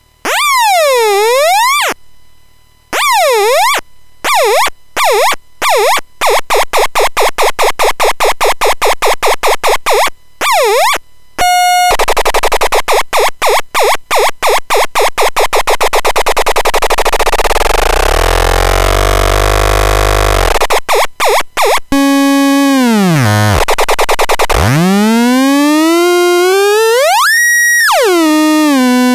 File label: magical 555 vco